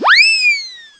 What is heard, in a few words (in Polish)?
gwizdek klauna